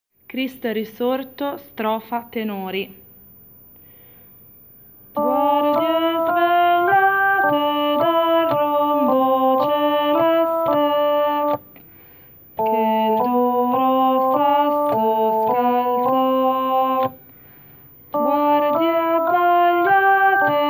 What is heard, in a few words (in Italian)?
Cristo è risorto strofa tenori